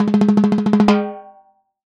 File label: Timbal, World Percussion; Drum Samples; Cymatics; PHARAOH Beta Pack; Pharaoh Premium Drum Samples (BETA)